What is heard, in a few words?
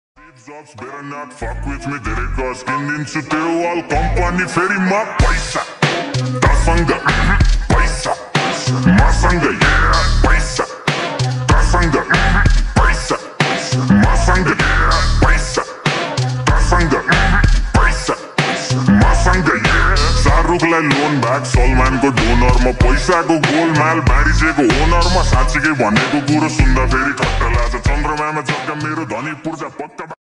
slowed reverb hindi ringtone